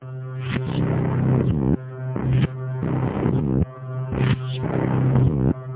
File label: close